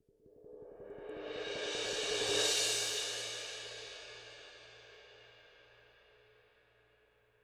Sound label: 18 CRASH SWELL, cymbals